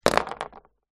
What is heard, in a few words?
leisure toy plastic figure place down on table; zapsplat